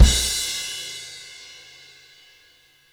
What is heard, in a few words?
CRASH kick, Drum Kits; Big Fish Audio - DOM; Redrum